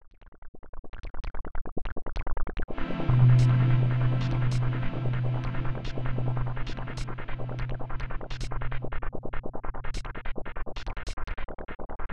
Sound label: In Game Bgm; Shinri Game 2 The Magical Trip 1995 SNES